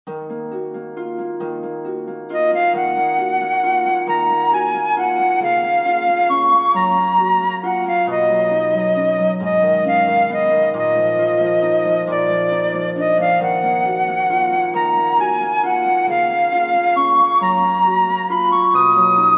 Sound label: waltz